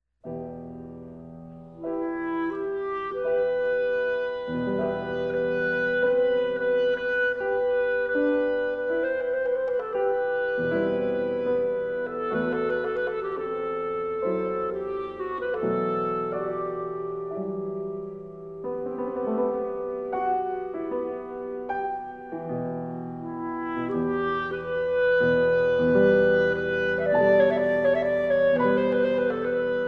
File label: Hebraic Music for Clarinet, Cantorial Chant; II. Suite Hebraic